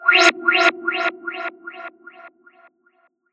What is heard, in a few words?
Old Dub